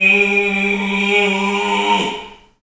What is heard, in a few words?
Bullhorn, Afro Horns, Africa